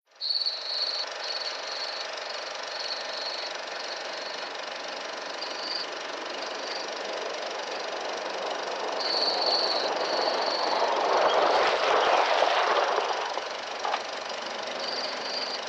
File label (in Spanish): ambiente autocine